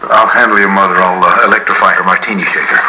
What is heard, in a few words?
shaker